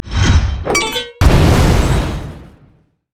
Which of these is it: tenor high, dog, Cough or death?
death